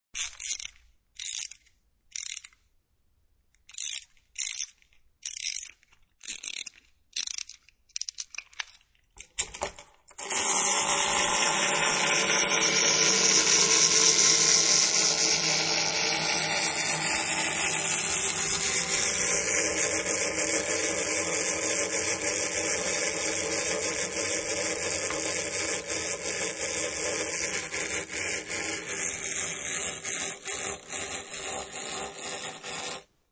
Wind Up Toy Run, Winding up of toy and letting it run; Children; Human